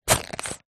household hair gel pouch noise squeeze empty just air; zapsplat